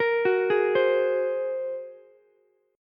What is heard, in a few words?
Bb 7th Suspended 2nd Sharp 5th Bb Ukulele, chord; 15,18,16,15; Bb7sus2#5 arpeggiated; JGuitar